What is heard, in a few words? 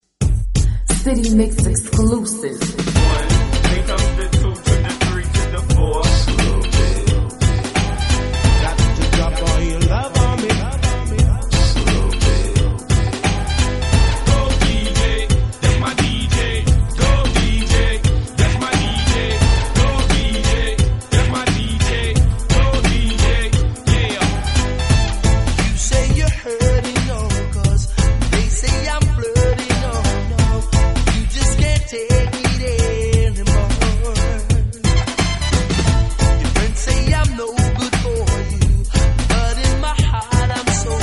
reggae